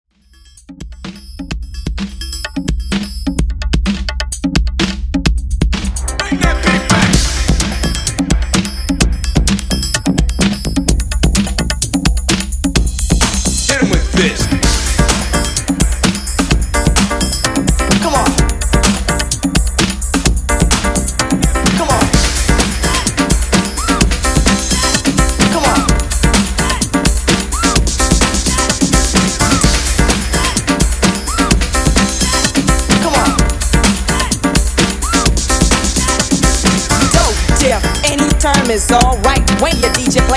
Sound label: Old School Redrum 128 Bpm